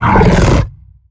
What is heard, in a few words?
Exertion Light; Evolve; Behemoth